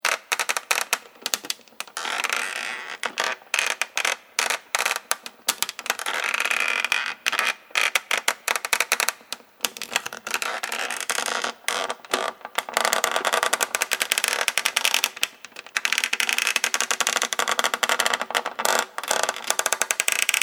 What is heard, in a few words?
Creaking wood; Creaking (Sound Effects:Objects)